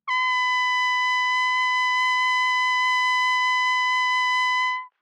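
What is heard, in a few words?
Trumpet vibrato ff B4 stereo, Bb Trumpet; MIS Pitches - 2014; Brass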